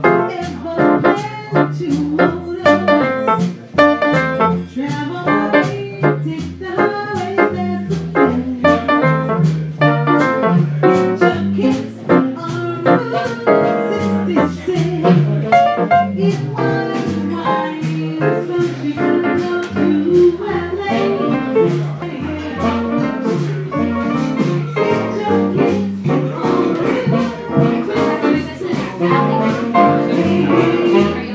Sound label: piano